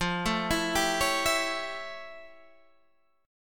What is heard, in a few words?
A 7th F, chord; A7/F; arpeggiated